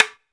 Sticks; SB Sticks; Tonga

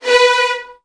VN 16TH, 3B2; ILIO; Kirk Hunter

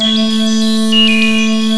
AMBI PAD

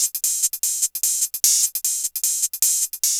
Hi Hat Loop; Electronic Point Blank

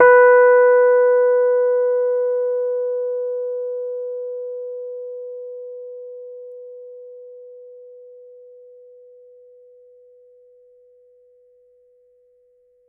B4 HI, Rhodes Mark II; CEPCo Sample Series Vol. 3

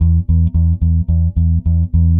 Eighth Notes E, Bass; Bass X

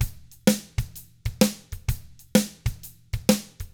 shuffled, Drums; ILIO; Double Platinum Drums 1

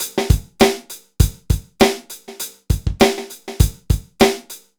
dry loop, BPM100

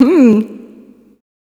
FEMALE, Dance Vocals

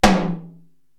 Tom Hi, Korg TR-Rack

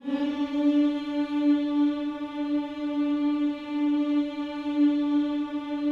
HMMS D 4, GOSPEL HMM; Spectrasonics; Vocal Planet

